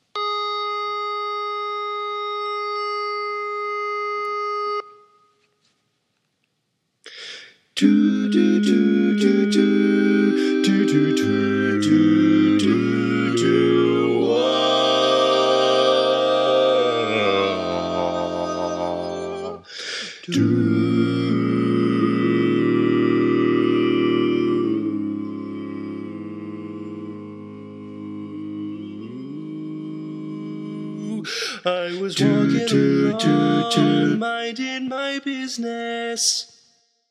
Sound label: Intro Bass